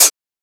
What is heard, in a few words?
Closed Hat; FLPRO